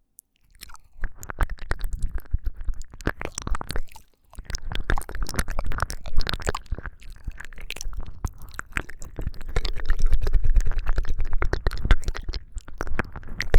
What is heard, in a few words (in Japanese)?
舌を感触を楽しむ耳舐め(両耳同時ver)